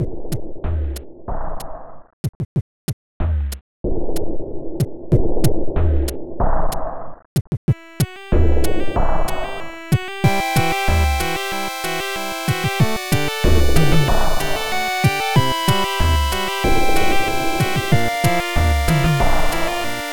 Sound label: Gestation (Internal Percussion); Cave Story